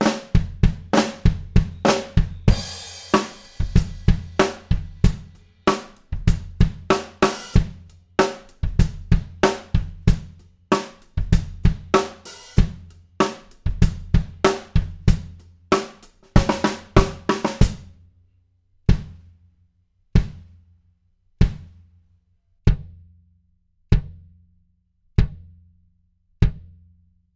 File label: Kick, drum; drum shootout